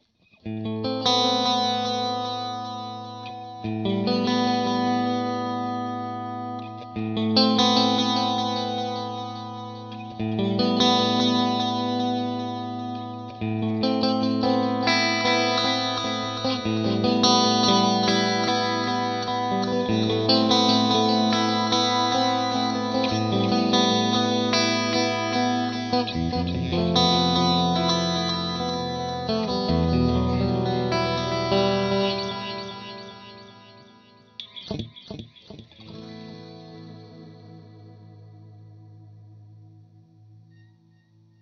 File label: direct